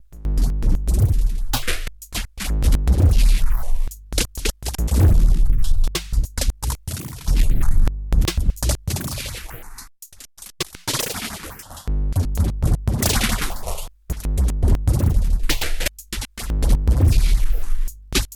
Erica Synths Black Hole DSP2 Drums massive contortion Dry Wet Clicking